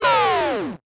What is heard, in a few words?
Animation Electronic; Adobe; Multimedia Internet CD-Rom Flash